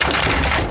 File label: arm retract, electromagnetic plant; Factorio